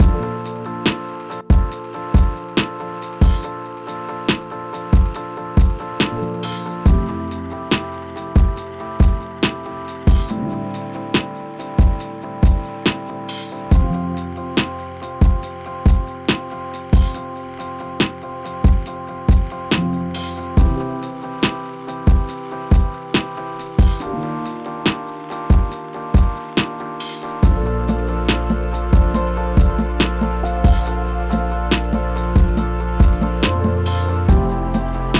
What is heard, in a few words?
Lo-Fi Wash Over Main